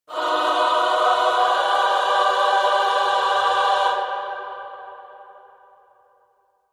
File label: Choir Only